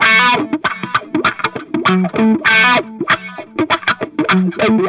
Guitar B min, Rock

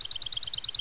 SPARROW; 鸟; animal

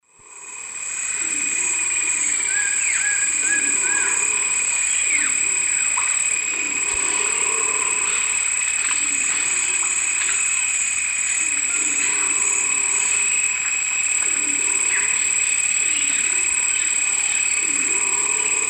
Philydor pyrrhodes, song; S. America; Eastern Lowlands

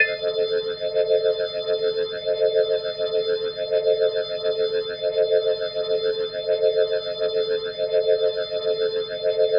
Glowing Organ A Dim+8va, Hip-Hop Organ Licks

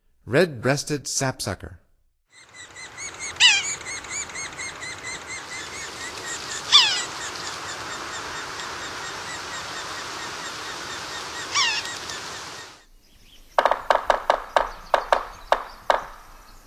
Red-breasted Sapsucker; North America; Stokes

